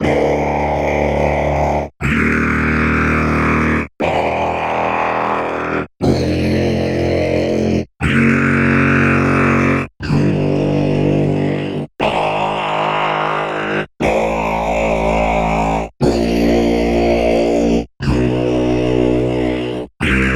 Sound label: Chromatic